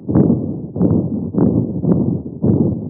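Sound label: Dog patent ductus arteriosus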